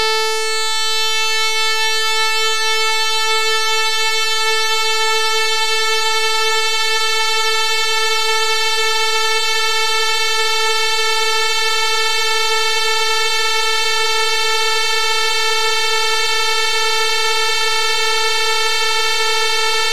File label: inharmonic clipped